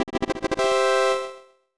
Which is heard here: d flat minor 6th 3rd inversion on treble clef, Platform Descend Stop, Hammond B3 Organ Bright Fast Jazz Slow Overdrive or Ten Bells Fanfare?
Ten Bells Fanfare